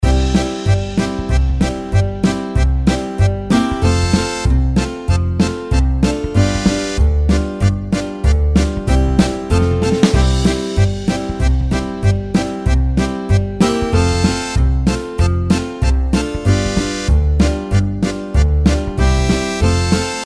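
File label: farm intro background music; Farm Mania; Happy Farm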